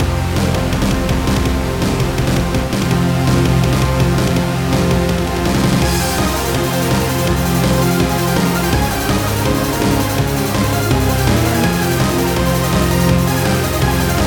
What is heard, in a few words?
Hard Chiptune remix